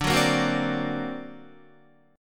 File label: D Augmented 7th D down Guitar; D+7 strummed; JGuitar